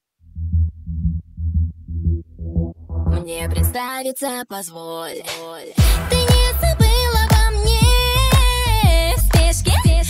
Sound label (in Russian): vocaloid на русском cover